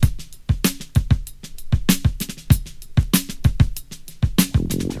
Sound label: 96 bpm breakbeat e key